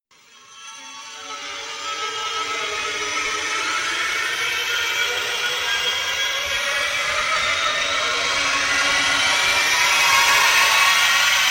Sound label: string ramp